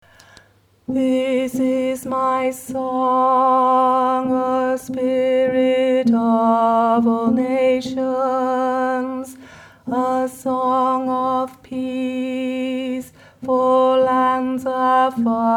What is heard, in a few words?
Tenor, gospel choir; Finlandia